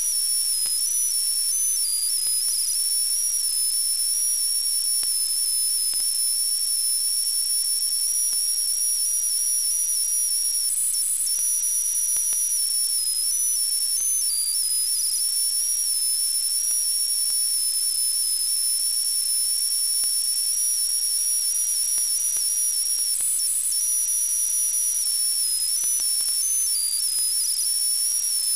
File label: TRANSIT 5B